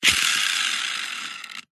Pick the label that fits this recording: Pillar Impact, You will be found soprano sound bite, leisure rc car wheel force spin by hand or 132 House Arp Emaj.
leisure rc car wheel force spin by hand